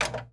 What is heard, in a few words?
Electronic Button Push Sound System